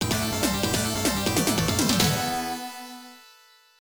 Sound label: Progress (Round Clear); mirai ninja namco system 2 arcade gamerip 1988